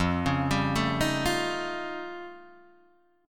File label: F Augmented 9th F Guitar Open D, chord